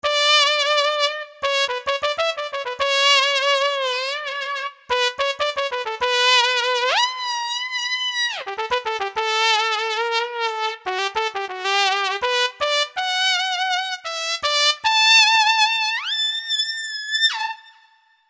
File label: Drunk Trumpet; V-Synth